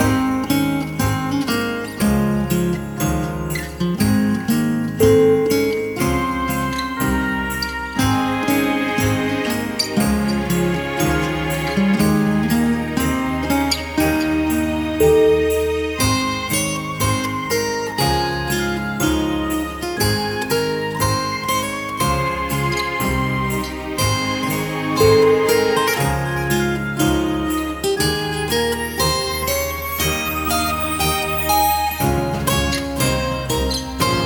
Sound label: christmas bell